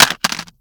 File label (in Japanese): もの落とす